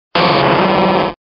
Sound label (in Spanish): Grito de Magcargo